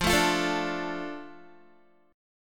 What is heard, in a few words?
F 6th F down Guitar; F6 strummed